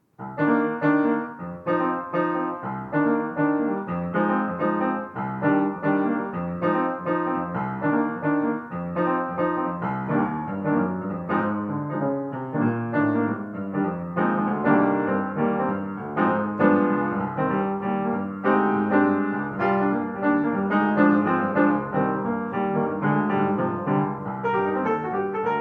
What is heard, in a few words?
acoustic piano minor energetic with walking bass